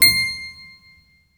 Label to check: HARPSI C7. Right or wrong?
Right